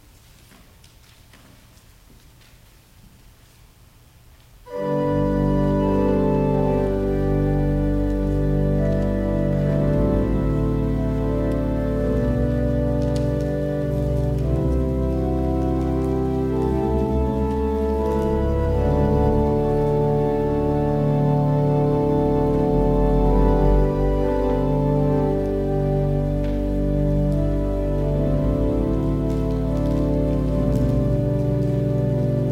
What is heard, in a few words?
Organ Music